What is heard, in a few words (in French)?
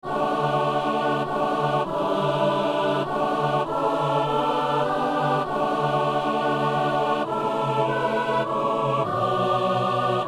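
messe anamnèse; messe de saint boniface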